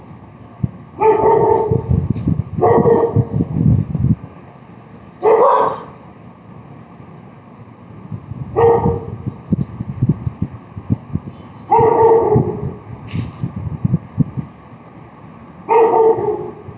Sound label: bark samples